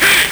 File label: LSDj Acid, Nintendo Gameboy; 300 Drum Machines